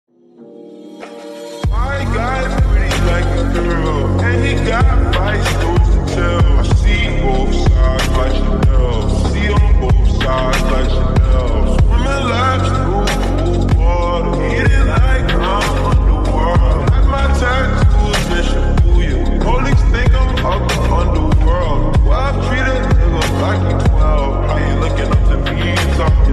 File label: slowed reverb